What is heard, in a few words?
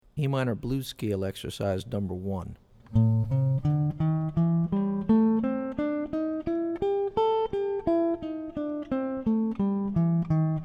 A Minor Blues Scale; lead skills materials